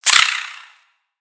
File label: Spider Get Hit; creatures mechanical; Lego